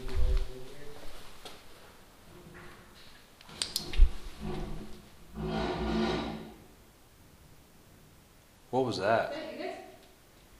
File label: bed slide